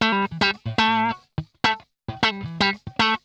PLUK, Funk Guitar; 90 s Sample CDs; Zero G

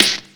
SD, Dance Mega Drums; Best Service; SD-HIP

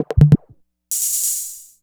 Percussion, Trance NRG, Drums

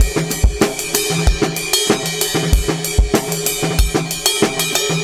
Live Beats, R&B Drums

